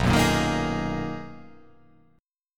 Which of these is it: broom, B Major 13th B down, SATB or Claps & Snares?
B Major 13th B down